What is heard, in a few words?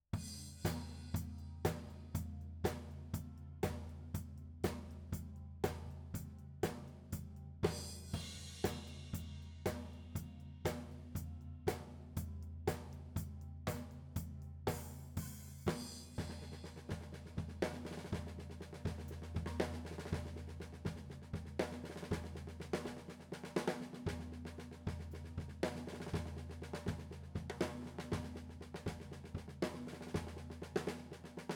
Tom L